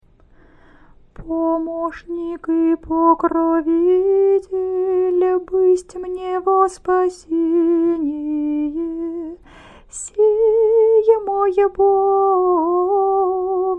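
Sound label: canon hirmos, singing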